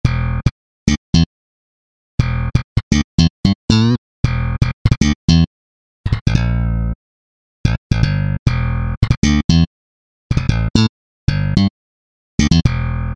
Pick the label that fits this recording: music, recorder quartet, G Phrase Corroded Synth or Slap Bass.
Slap Bass